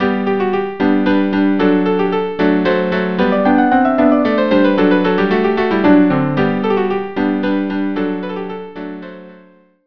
Polonaise

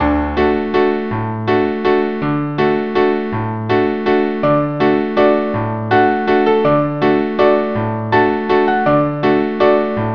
waltz quartet; faust

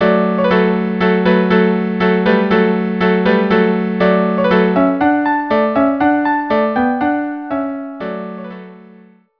Dance viola cello; Dance of Tumblers

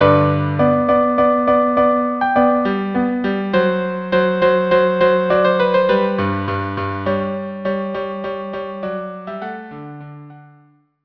Minuet violin cello duo; Don Giovanni

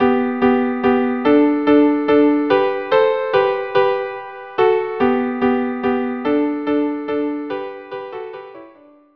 viola duo; Rossini; 5 duos

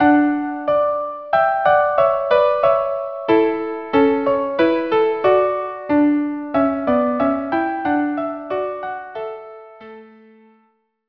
violin duo; la grace telemann

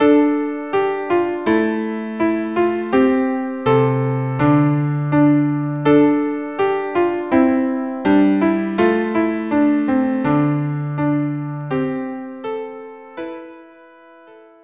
viola

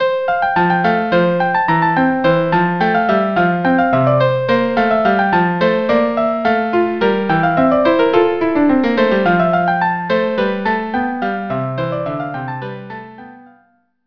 Handel Sonata in F M Allegro

